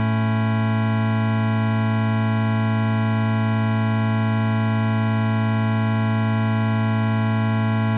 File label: a chord; Omnichord